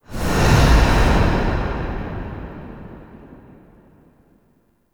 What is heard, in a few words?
Menu Open, UI; Warframe; Awakening Remaster